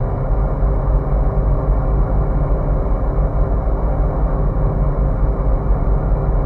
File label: Car Hydraulic Brakes